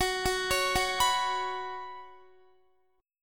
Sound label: F sharp Suspended 4th, Guitar; arpeggiated; x,21,18,18,18,19